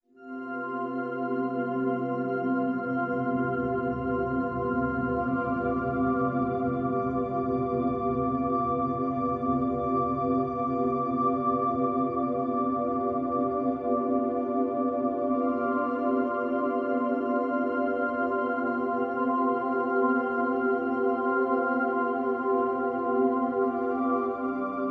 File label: Slow, Dramatic drones, Higher pitched melodies; Warm Ambient; Ambient SoundScapes